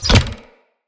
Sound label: Player Bow Headshot Hit; Weapon Impacts; Sweeteners